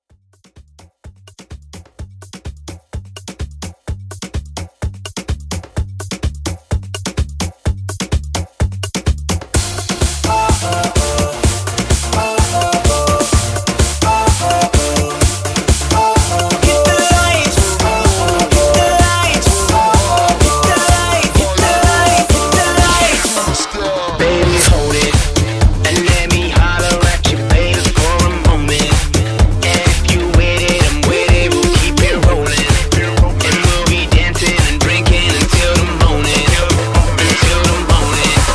R B Club House 127 Bpm

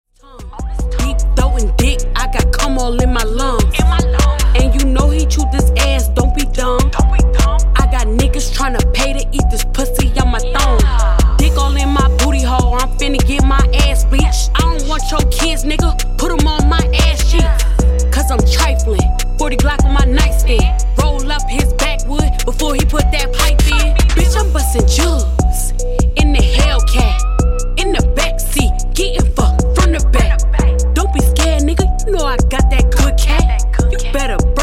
Funk Intro Outro 75Bpm

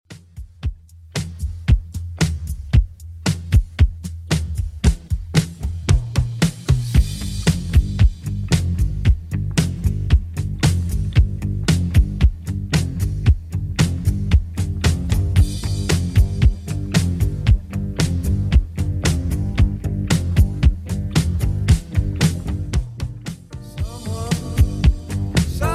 70s Redrum 114bpm

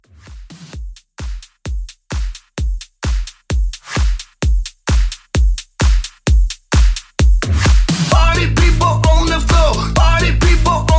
Club Hype Edit 130

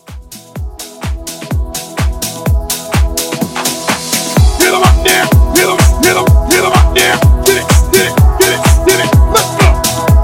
House Scoop 126

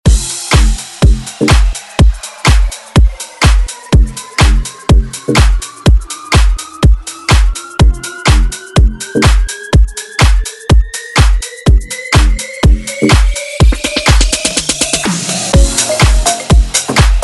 Future House Edit

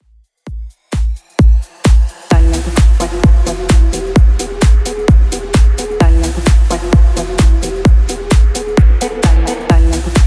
Top40 DJ Friendly Redrum 130bpm

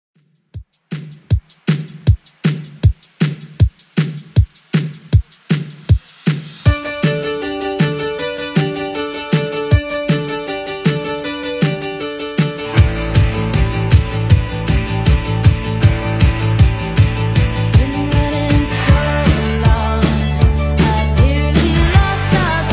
80s New Wave Redrum 157bpm

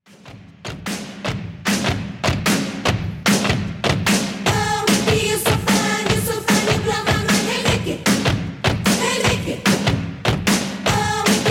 150Bpm

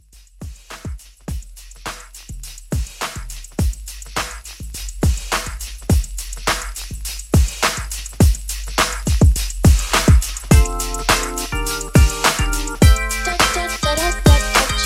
Mixshow Re Drum

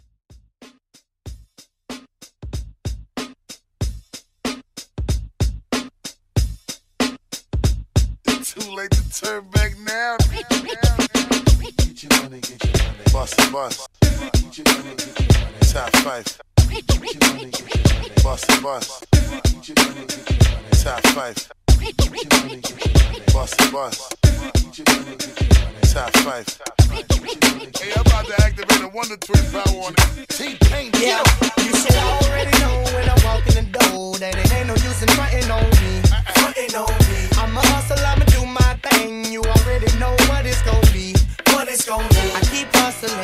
Old Skol Hip Hop Redrum 94 BPM

